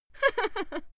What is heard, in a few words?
female laugh